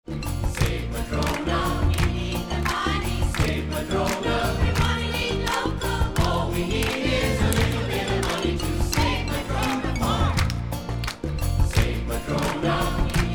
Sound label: jingle